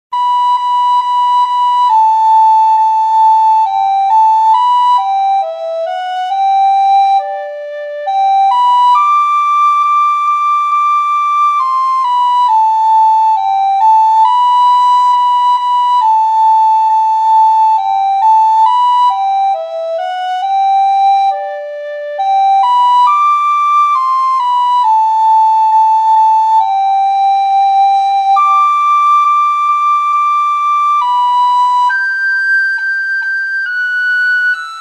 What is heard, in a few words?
waltzing matilda soprano recorder